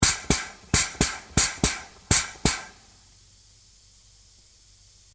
Pneumatic Grease, Scifi, Pneumatic, Air, Burst, Science, Fiction; Spacecraft; BlastWave